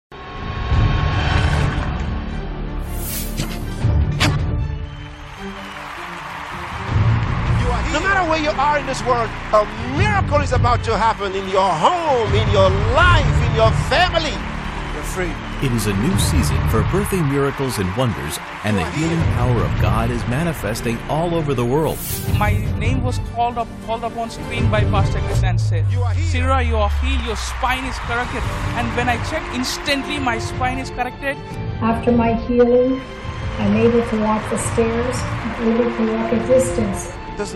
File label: JINGLE